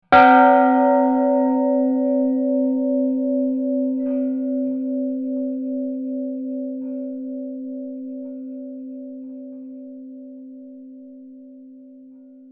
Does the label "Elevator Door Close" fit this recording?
No